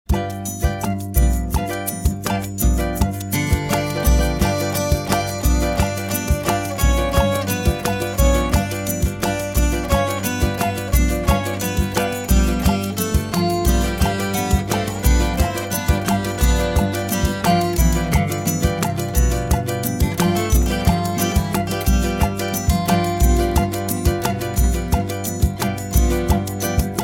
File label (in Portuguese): Toada